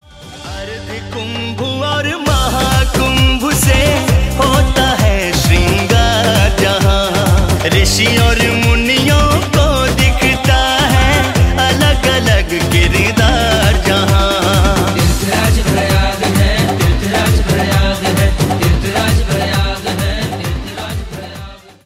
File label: Ringtone